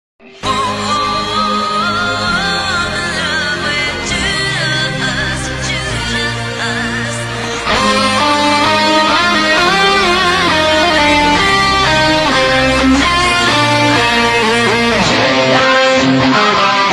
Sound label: guitar cover